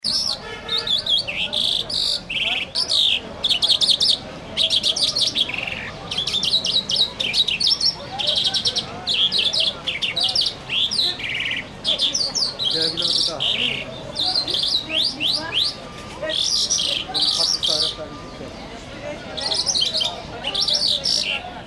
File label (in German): Kashgar Voegel